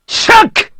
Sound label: strike; ky kiske